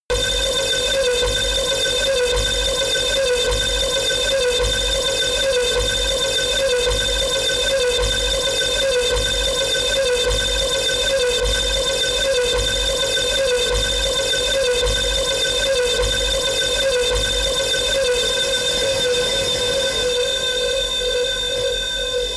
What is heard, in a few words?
siren